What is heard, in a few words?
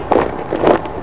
MARCHING; MOVEMENT